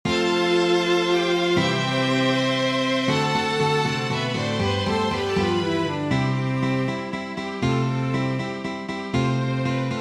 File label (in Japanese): Gtr